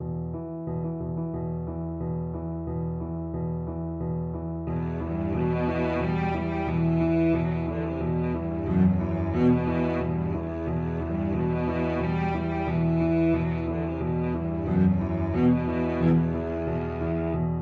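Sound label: violoncello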